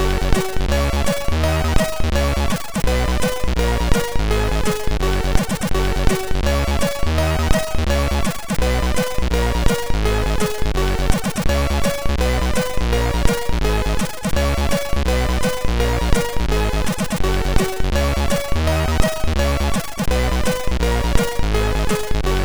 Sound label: Xmas Rock, sid MOS6581R3